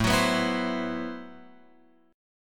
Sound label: A Minor 6th Add 9th A Guitar Open F; Am6add9 strummed; x,4,6,6,7,7; JGuitar